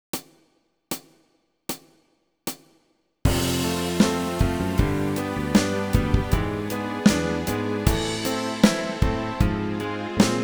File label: backing track